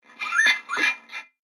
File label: Pet Dog Robotic Idle; creatures; pets; Lego